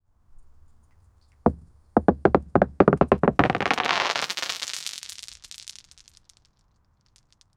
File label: big stones falling; rocks falling